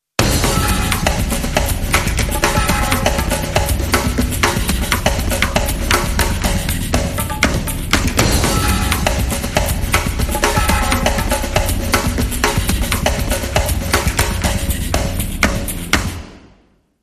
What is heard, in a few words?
Loop